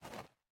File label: Walking